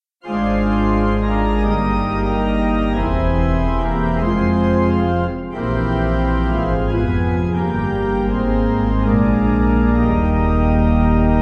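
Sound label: pipe organ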